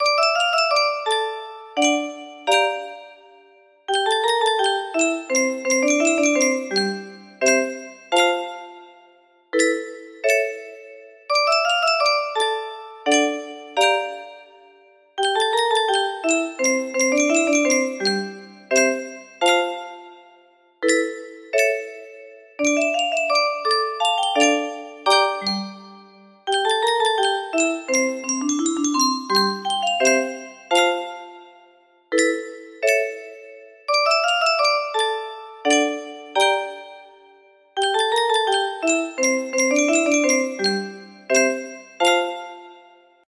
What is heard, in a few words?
melody